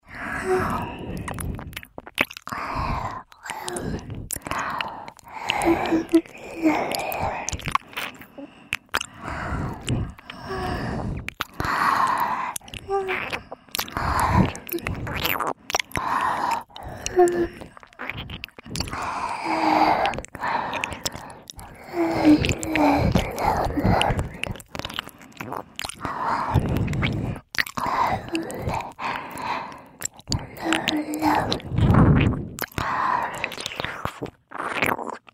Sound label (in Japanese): 右耳舐めループ; 通常ver